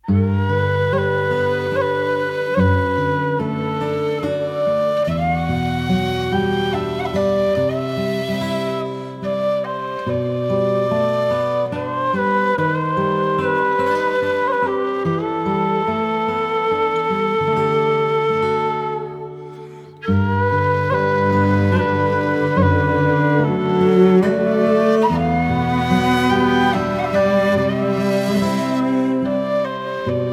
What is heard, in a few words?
Bgm town general ancient